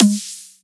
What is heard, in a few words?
electronic snare drum; DJ Booth; effects